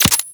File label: Pistol Projectile Attach; Guandao